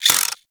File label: Quill Dart Gun Reload